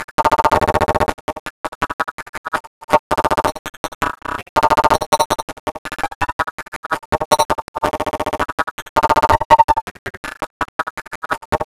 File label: Subtractive Beat